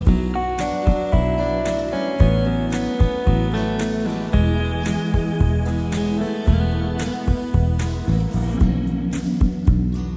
instrumentaal

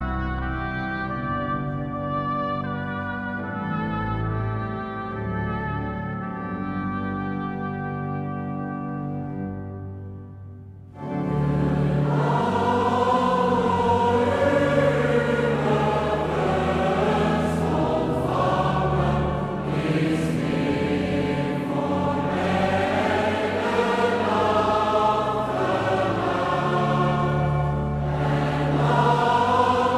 Koor gemengd

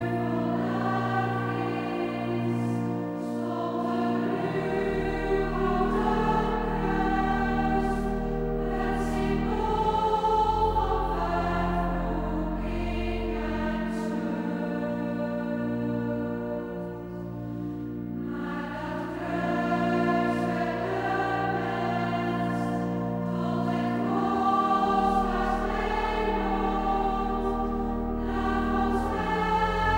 Koor gemengd

